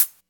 Prominent Hi Hat C Key, Loudest frequency: 11821Hz; hat samples